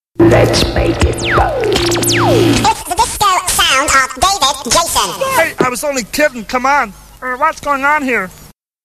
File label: Jingles